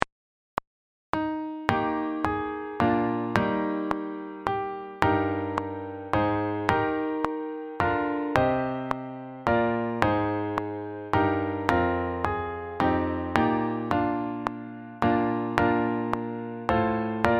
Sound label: simple chords metronome